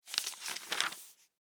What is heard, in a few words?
Office Book Hard Cover Page Turn Long; Offices